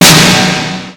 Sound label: k1, Kawai K1 Drum Machines; 300 Drum Machines